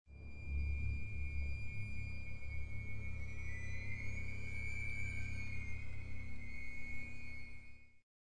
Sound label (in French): gliss